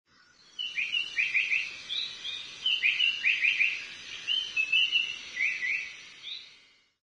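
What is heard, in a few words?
Japanese paradise flycatcher, takara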